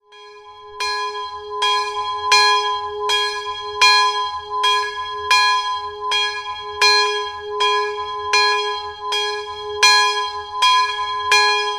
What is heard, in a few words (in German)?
Glocken; Kaldorf BEI